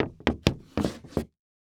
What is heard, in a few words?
Foley Footstep Cowboy Boots Stumble On Solid Wood Platform; Foley Footsteps